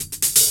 hihat open

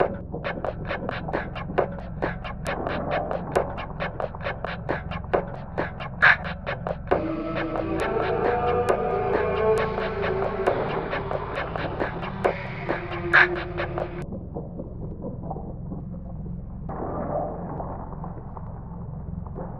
BPM 135; MAGIC CITY

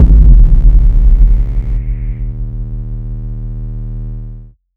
Not Nice 808's

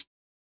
Hit, Closed Hats

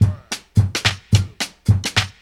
Loop

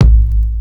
KIK

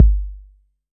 Not Nice 808's

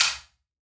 percussion zap

